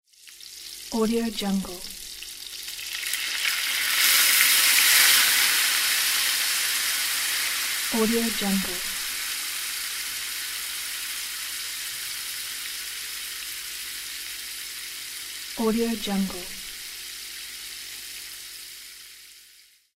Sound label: frying steak hissing meat on pan; jdproduction